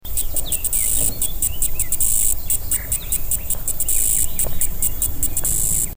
Oligoglena tibialis

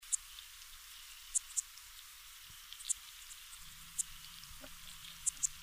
Micronecta sp

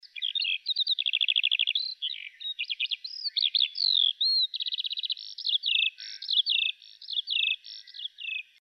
Alauda arvensis; BIOAC AVES

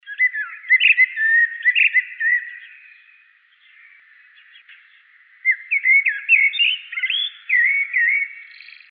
Turdus merula; BIOAC AVES

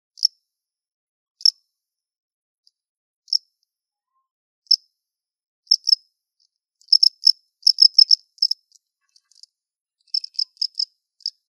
Acheta domesticus; BIOAC ORTH

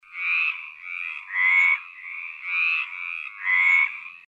Hyla meridionalis; BIOAC AMPH

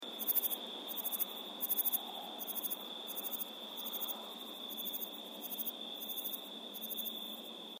Platycleis grisea grisea; BIOAC ORTH

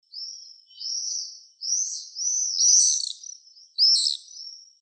Apus apus; BIOAC AVES

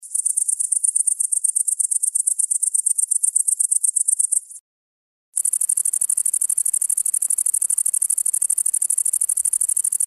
Tettigonia viridissima; BIOAC ORTH

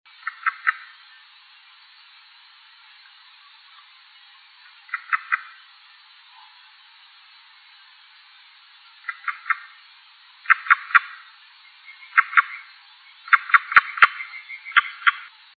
Pelobates fuscus; BIOAC AMPH

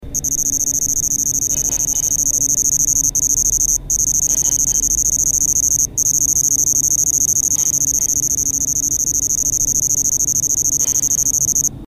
Svercus palmetorum; BIOAC ORTH

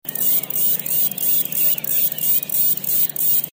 Tettigettula pygmaea

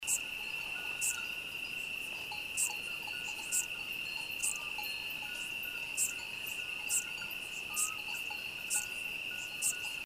Eupholidoptera magnifica; BIOAC ORTH

